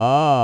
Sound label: bard vibrato, vowels; Impedance Mapped; Bard Cubed